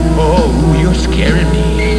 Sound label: scare